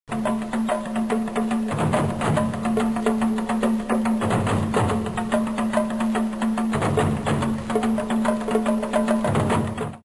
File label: african drums